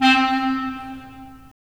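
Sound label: wind clarinet; Roland - LA Composer vol. 3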